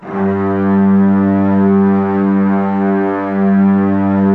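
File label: violins, low violins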